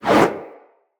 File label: Fire Arrow Whiz By; Level Events; Midrath; Warsong Prologue